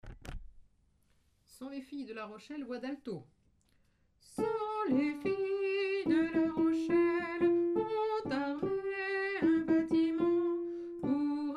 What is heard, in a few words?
alto